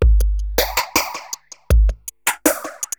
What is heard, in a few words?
DRUM LOOP ambient 160